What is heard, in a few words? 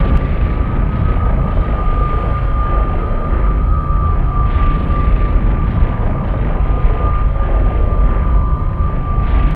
Effect Telekinesis